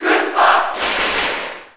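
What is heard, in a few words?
Cheer Japanese; Koopa; SSB4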